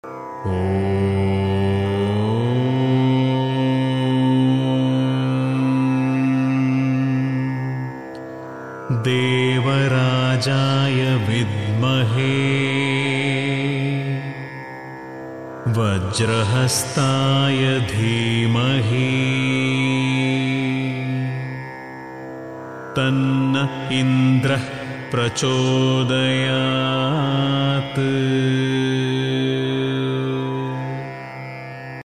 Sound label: indra gayatri